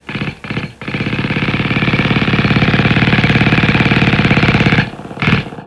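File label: jackhammer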